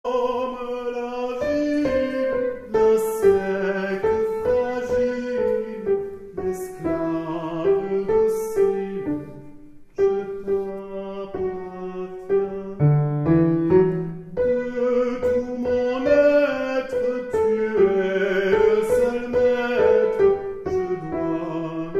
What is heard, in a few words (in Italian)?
Soprani